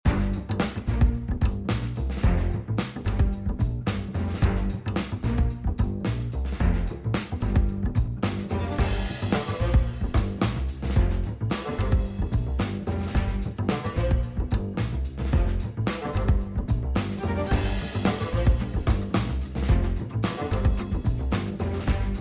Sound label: game bgm; bloons; ChillConnectAssets